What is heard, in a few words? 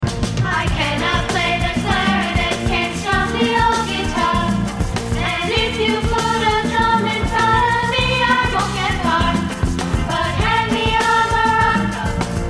maracas